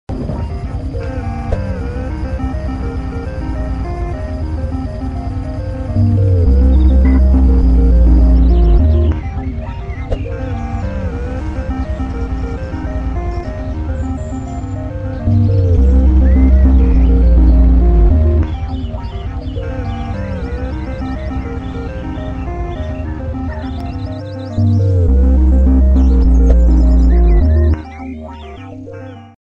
Hey taxi Music; Dude Theft War; Android

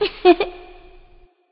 Laugh

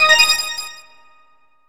Silver Coin; Diddy Kong Racing; Nintendo 64

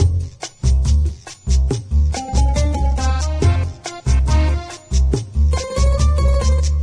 Italian Music; Diner Dash 2 Restaurant Rescue

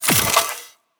Weapon Reload (Flamethrower)

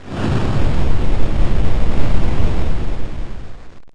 Fire Blast

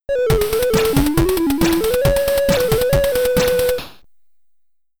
SID Stereo; one on one jordan vs bird; commodore 64

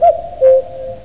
Cockoo; Buggy; PS1

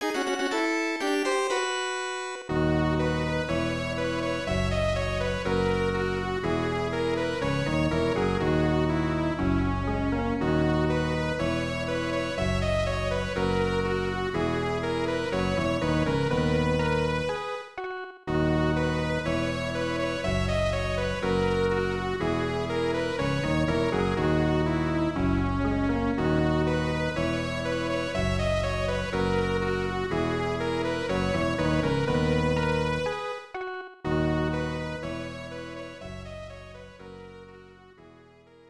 Level Up; Princess Minerva; Vic Tokai; Super Famicom

